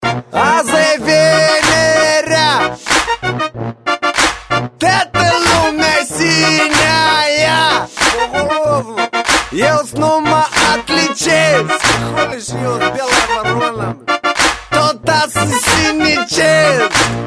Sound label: Is Calling Rap, ringtones